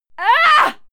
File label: female fight attack hit; schreie; menschen